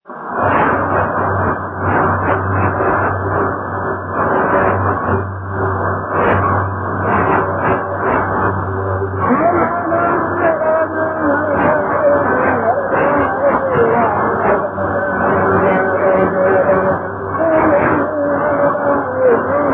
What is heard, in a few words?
5050 kHz